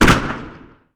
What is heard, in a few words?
weapon